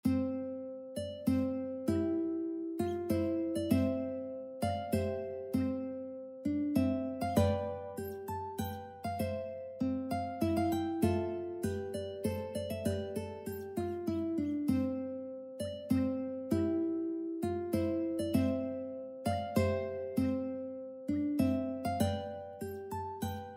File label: uke trumpet tune; purcell